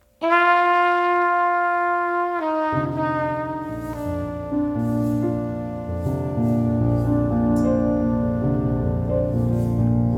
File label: quartet